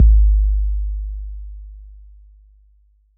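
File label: bass drum 808 Decay E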